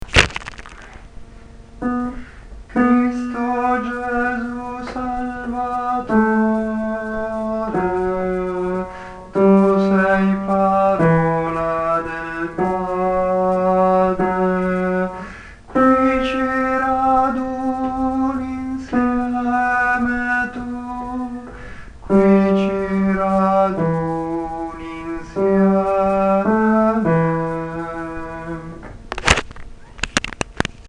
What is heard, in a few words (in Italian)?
tenori; Cristo Gesu Salvatore